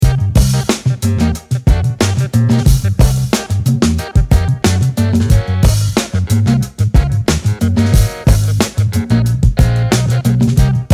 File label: Mozzarella BACKING TRACK, 91 bpm